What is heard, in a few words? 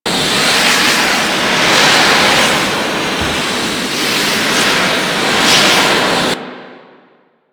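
Flak Cannon Projectile Loop; Grineer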